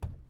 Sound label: Soft Wood Kick